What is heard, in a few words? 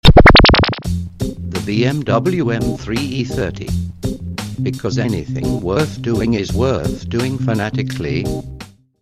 Bmw